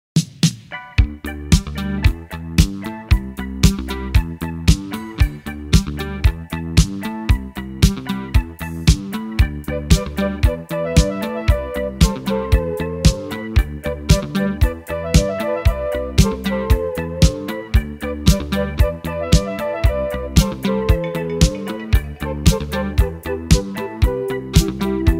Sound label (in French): accords